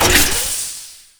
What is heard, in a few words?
Gun Ammo Out Scifi, Weapons Foley; SFX Kit